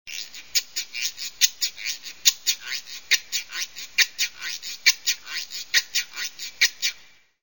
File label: guiro